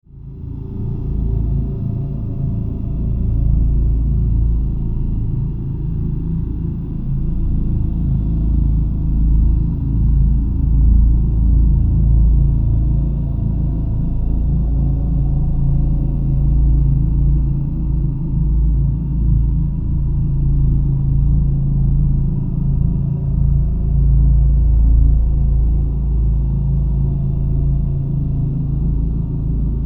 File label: DRONE DARK AMB, Drone Dark Ambient Bass Reverb Swell; Blastwave